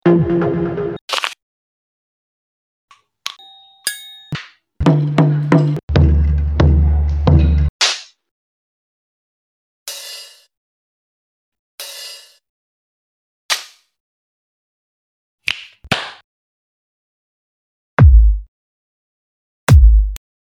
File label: drummer; freedom